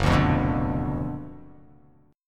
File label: F sharp Major 7th Suspended 4th Sharp 5th F sharp